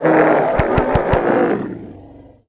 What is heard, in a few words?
alert; unreal; titan